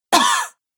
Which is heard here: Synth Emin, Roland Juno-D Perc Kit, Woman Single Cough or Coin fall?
Woman Single Cough